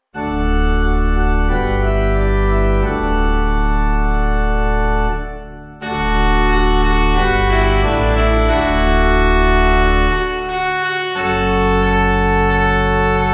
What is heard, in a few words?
Trumpet Pipe; Venite